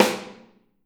Snare OH; Snare OH All; Drums Percussion

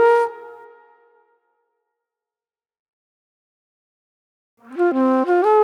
Flute Noir

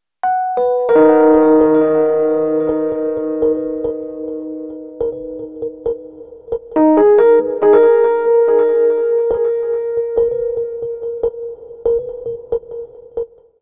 Ambient Rhodes; Sonic Foundry; Cinematic Funk Urban Tone Poems; Master Zorn

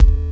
Synth OneShot; Sonic Foundry (Sony Creative Software); Rhythmicronics

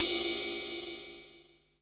Ride Jazz, Mixed Percussion; Jungle; Percussion Hits; Zero-G Jungle Warfare 1, 2 & 3; 90 s Sample CDs

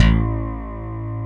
DIGI BASS; DIGI BS

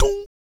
VOC PERC, Vocal Planet Jazz FX BASS PERC

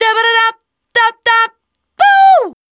VOC F, Dance Vocals, FEMALE

